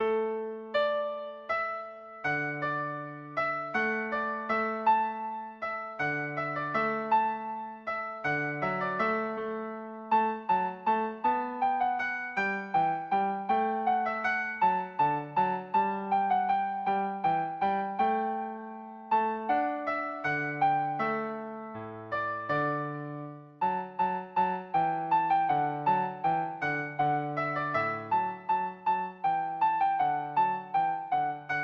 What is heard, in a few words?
violin cello duet